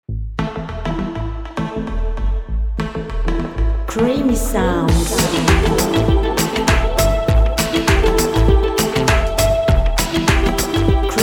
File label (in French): creation musicale serious electro